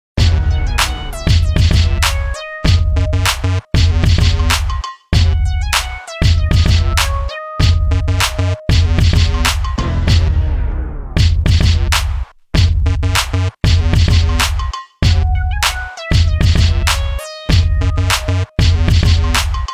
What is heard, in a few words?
Hiphop videoringtone; Nokia; audio themes